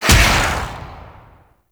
Roar Attack Pulse, Infested; Warframe; Zealoid Prelate

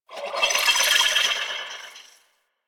Ship Market Data Blips; Warframe; Corpus; Ship Remaster

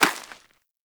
Rodent Hop Step Rear Dirt, Footsteps; Warframe; Characters

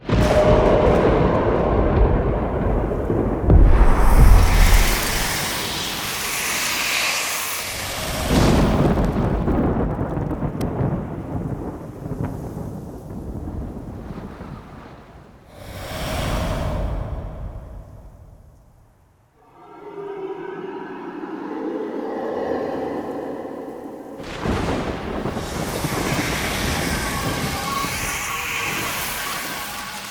Ambience; Cinematics; Drifter Lotus Operator Awakes

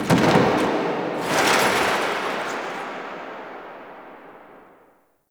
Stadium Metal Crash; Ambience; Warframe; Nineteen Ninety Nine

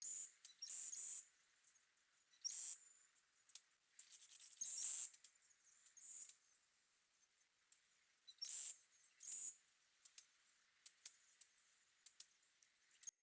Critter Loop, Ambience, Glades; Glades Of Andurin; Critter Loops

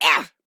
Pain, Female; Warframe; Modular Corpus Female; Battle Barks; en

